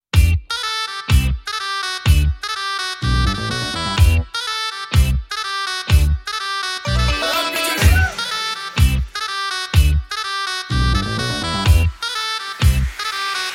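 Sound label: Love background music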